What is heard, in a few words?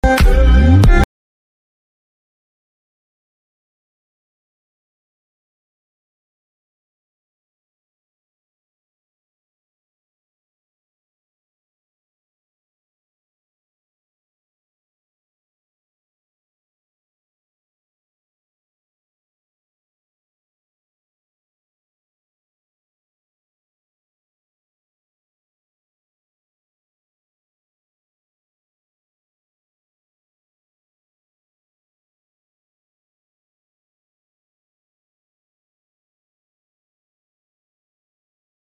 call ringtone bgm